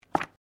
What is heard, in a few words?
Book Open; Book movement; Books; Offices; SoundEffects-Foundation1